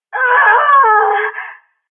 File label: death, player